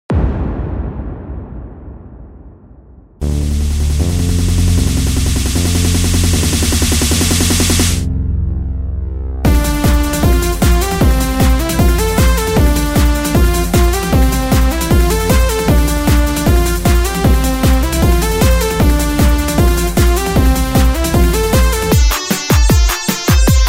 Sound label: instrumental